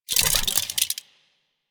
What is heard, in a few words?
Sentient Metallic Move; Enemies; Sentient Movement